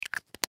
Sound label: Bottle Cap On, Bottle Cap On Bottle; Foley; Blastwave